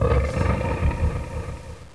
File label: Lion door; GZ19